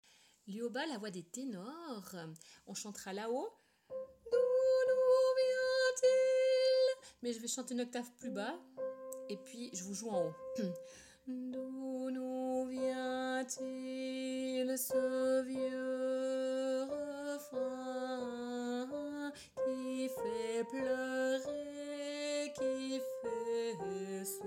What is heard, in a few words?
Liauba Tenor; 4 Voix